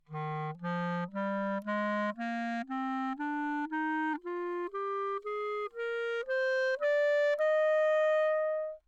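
ascending 2 octaves, romantic clarinet bb, Anechoic; OPENAIR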